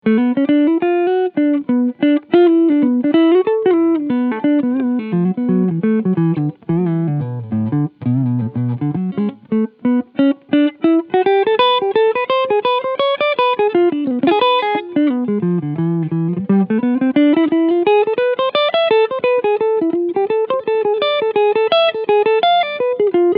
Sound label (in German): Cleansound Neck Humbucker; Harley Benton Aeolus